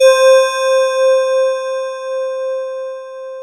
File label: TX C4, SYNTH; AKAI S-Series CD-ROM Sound Library VOL-2